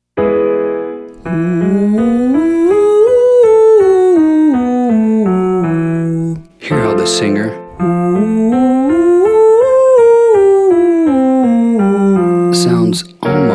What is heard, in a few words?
hooty Ooo female; Singing